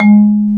Bass Marimba

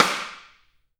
STICK, Drum Dry; East Collexion; Drum 1

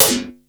PSEUD SNR, PLASTIC DRUM; Crypton - DrumBase5000

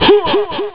vocal; jazz jackrabbit 2; M8

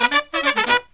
Killer Brass Riffs; USB Soundscan vol.29 - Killer Brass Riffs; AKAI

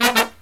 LOW HIT, Brass

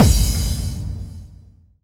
BD, Dance Drums

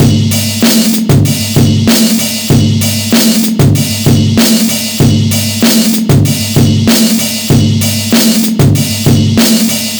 96, Live Breaks

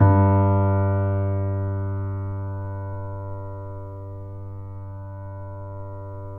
PP G1, Grand Piano; AKAI S6000 CD-ROM - Volume 2; Grand Piano 256M

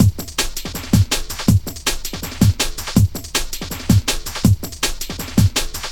Limiter, Loops, breaks; m8 breaks

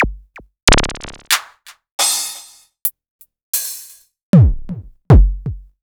Korg Electribe ER-1 Kit

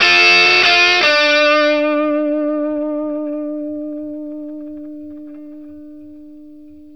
BLUESY D 60, Vintage Blues Guitar; USB Soundscan vol.22

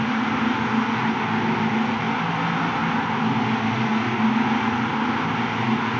Panned Organ, non tempo loops

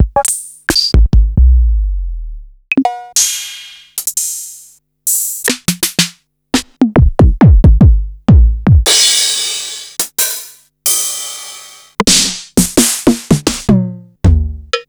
Yamaha Motif ES-Series

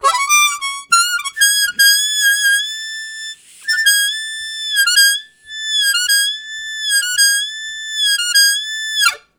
Minor High, Harmonica Riffs; Zero G

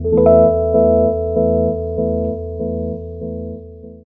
Rhodes Lick; Zero-G Jungle Warfare 1, 2 & 3; 90 s Sample CDs

